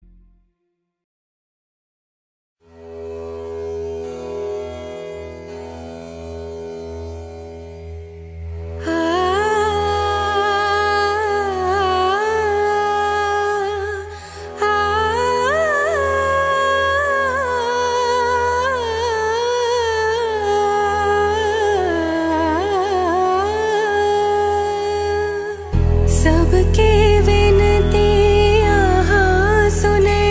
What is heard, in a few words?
Female; Ennathan Pesuvatho (2014)